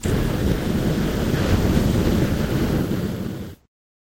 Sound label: FLAMETHROWER